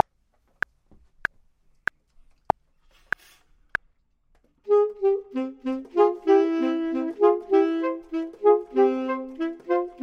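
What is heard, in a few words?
both, Saxophone and Clarinet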